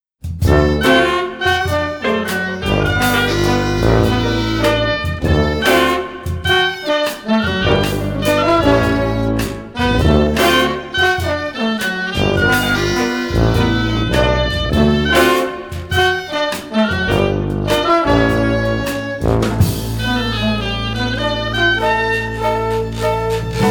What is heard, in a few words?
walk